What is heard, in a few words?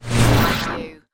Whoosh; Sound Effects Bible